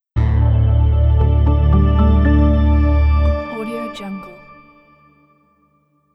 technology logo

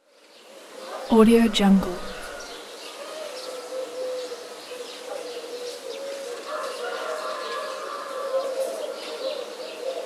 village ambience

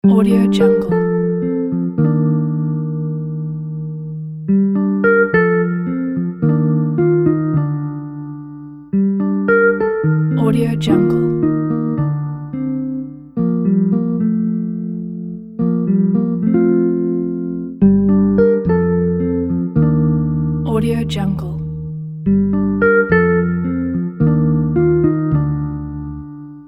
upbeat inspiring

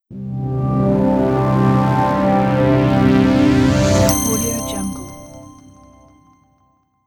modern animation logo reveal

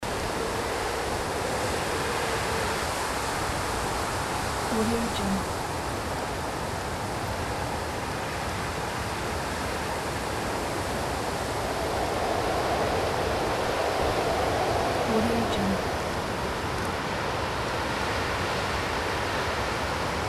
wind loop

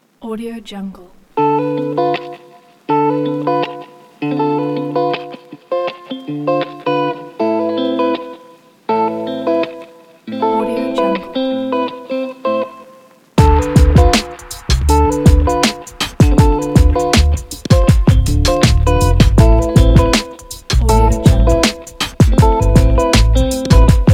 romantic hip hop electro guitar

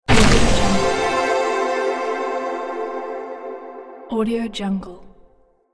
dungeon tunnel reveal; sonic-boom

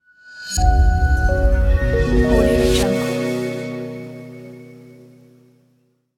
a bright logo

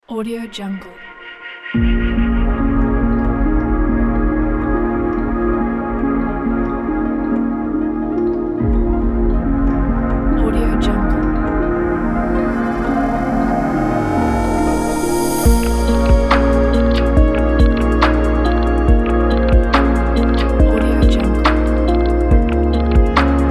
science space documentary

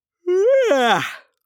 cartoon vocal yawn vocal

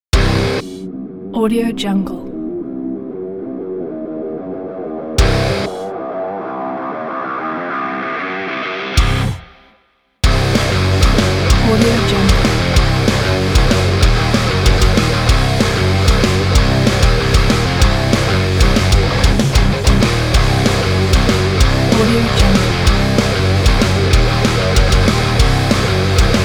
drive

